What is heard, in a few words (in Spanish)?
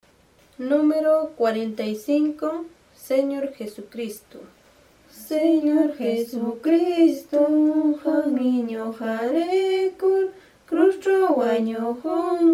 cancion